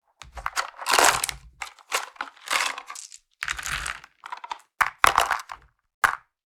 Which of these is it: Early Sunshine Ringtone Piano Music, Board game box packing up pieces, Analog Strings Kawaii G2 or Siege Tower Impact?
Board game box packing up pieces